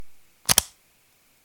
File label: ios 5 lock sound